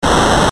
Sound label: retro; maoudamashii